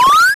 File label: fled; flixel; TurnBasedRPG